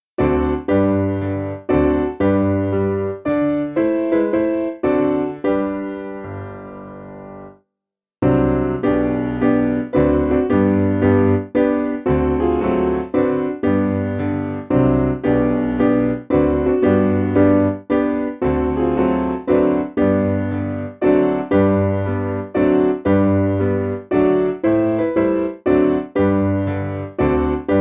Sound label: S Piano